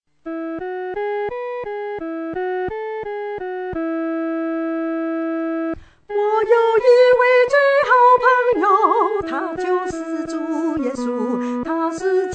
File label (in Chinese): 独唱 第一声; 谷中百合花